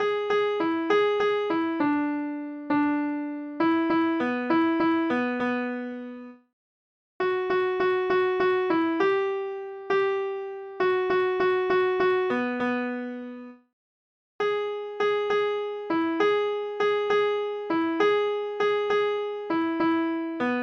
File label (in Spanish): teclado